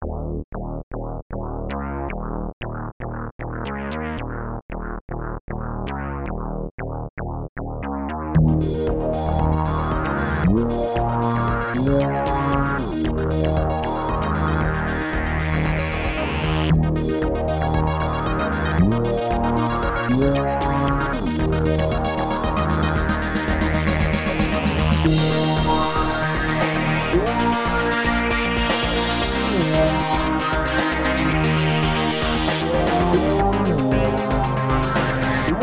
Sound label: zero delay filter sweep